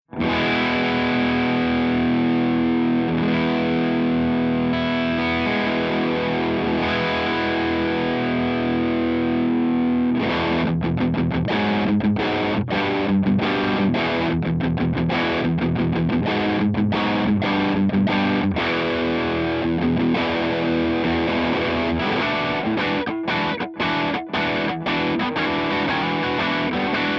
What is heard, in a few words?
MARSHALLJTM60 CH2DRIVE P90